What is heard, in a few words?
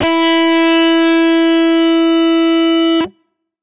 guitar electronic, distortion guitar